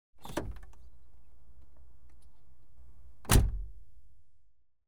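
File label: interior Door opens, shuts, Cars; BBC Sound Effects Library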